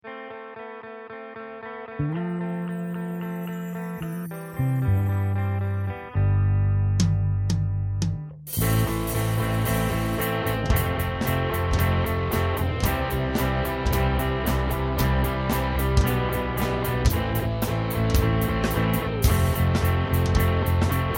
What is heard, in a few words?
drum 4 4 rock beat; natan ramos